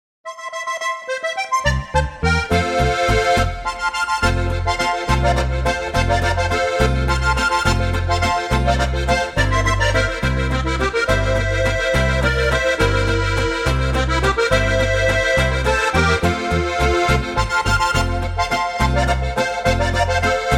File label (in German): Harmonika